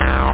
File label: Bass Rave